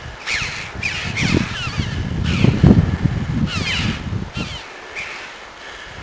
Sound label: chough